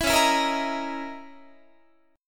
C sharp Minor 6th Add 9th C sharp, Guitar; C#m6add9 strummed

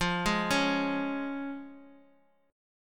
F Minor Sharp 5th F down; Fm#5 arpeggiated; JGuitar

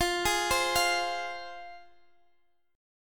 F Minor, F, Guitar, Open D; x,x,15,14,15,15

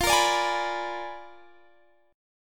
Bb 7th Flat 9th F

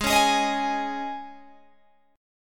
G sharp Suspended 4th G sharp, Guitar Open G

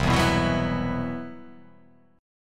C Major 7th Flat 5th C

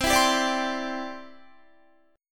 C Major Add 9th, Guitar, Cadd9 chord; strummed; JGuitar

